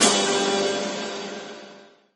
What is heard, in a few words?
clash